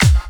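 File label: snare drum bass drum, Dance Mega Drums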